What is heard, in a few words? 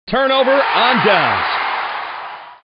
turnover